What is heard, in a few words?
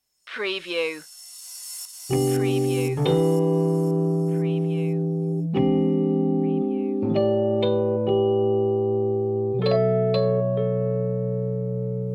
jazzy night loop intro; media music now